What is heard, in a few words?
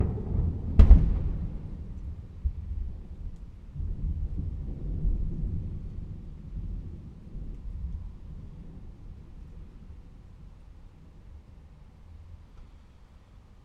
Deep Thunder Clap